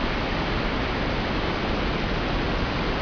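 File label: amb waterfalls far loop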